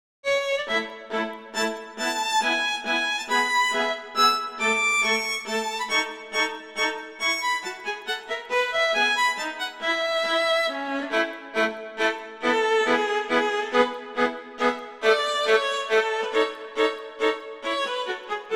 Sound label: Minuetto, Allegro; Mazas; Duets; Duet 10